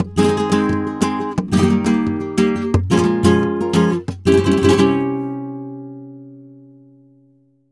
flamenco guitar, tango

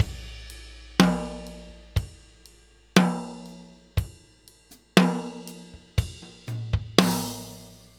New York Loop, Drum Tools, Laidback

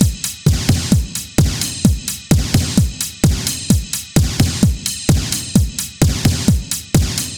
Beat Rapid; Zero-G; Future Wave; Beats

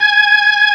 AB6, B3 ORGANS; Greytsounds

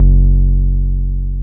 303 MUTED F#1, ROLAND TB303; Big Fish Audio; First Call Vintage Keyboards

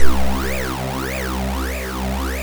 2600 C1, ARP 2600 Synthesizer; Hollywood Edge - Giorgio Moroder Rare Synthesizer Collection

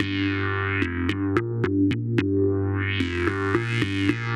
Moog Passes A 110 G, modular 110bpm

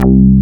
ANA MHL BASS, SYN BS; SAMPLE WAVE MIXING 1

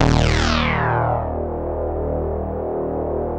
LEADS; Masterbits; Vintage Voltage

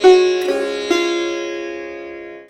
SITAR LINE, SITAR MELODY

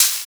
Shaker; Equipped Music; Loungin House

